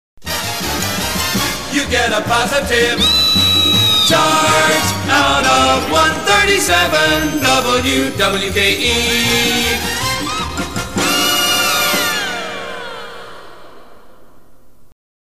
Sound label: jingle A Positive Charge; WWKE